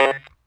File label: tek bleep; Sonic Foundry (Sony Creative Software); Synchro Funk; One Shot Sonics